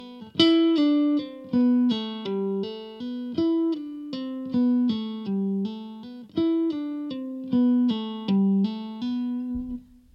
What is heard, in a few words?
Legato